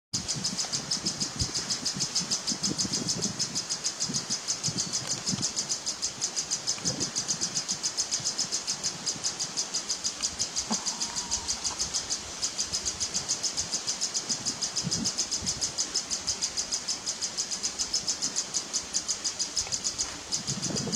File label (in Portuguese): Canto do Beija Flor Rajado